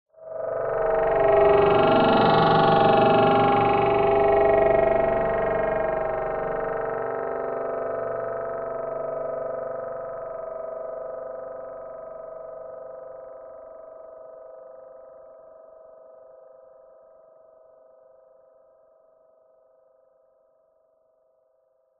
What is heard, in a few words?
fm growling delayed short drone; sound design